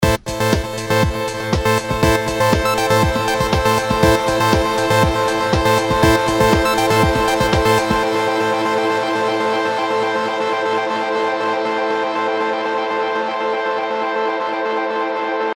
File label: Feedback